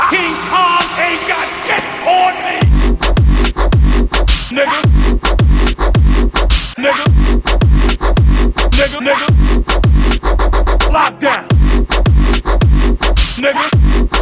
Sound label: Dubstep; King Kong